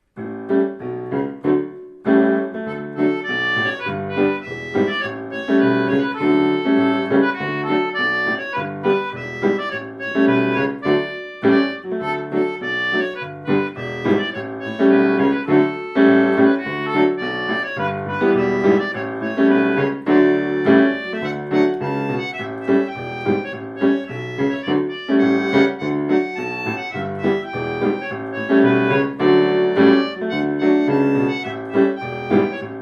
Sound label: polka